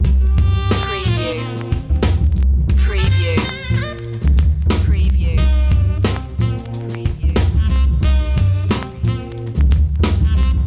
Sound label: this is not jazz loop e